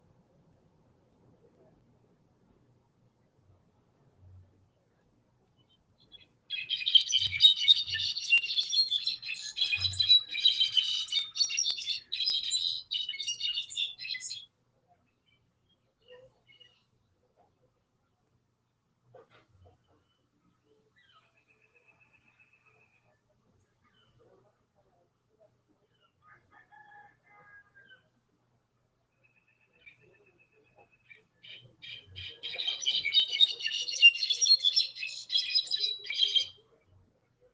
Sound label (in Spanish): JILGUERO; fauna en peligro; morelia